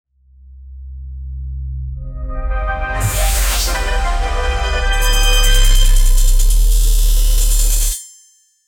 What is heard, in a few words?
cinematic intro